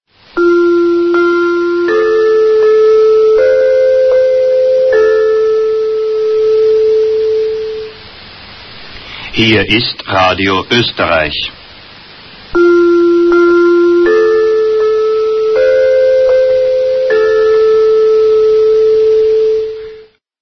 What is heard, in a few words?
interval signal; radio oesterreich c1953